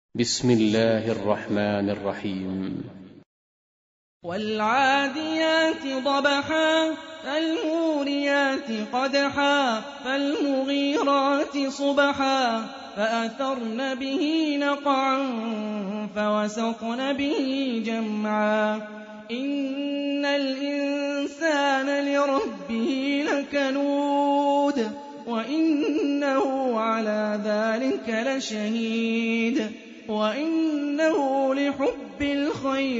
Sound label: Quran; Hani Refaey